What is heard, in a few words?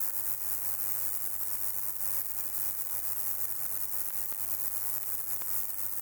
accumulator idle; factorio; Factorio 0.14.21